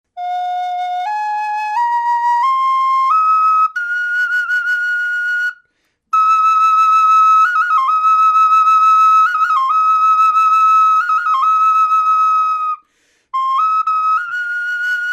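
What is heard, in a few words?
natural, flute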